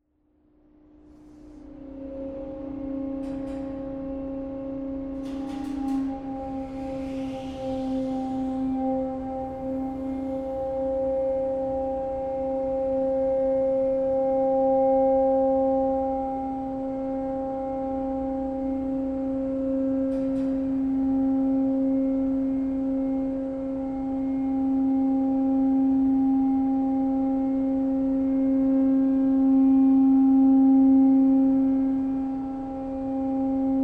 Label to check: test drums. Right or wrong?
Wrong